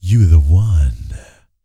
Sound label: Vocal Planet R&B Dance BASS CATCH